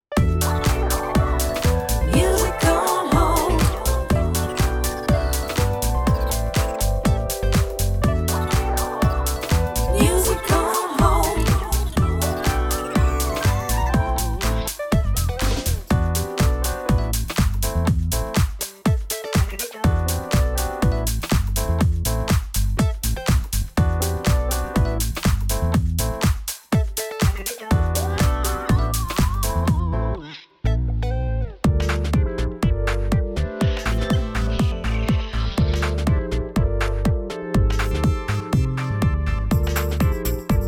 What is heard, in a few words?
jingle mix, music on hold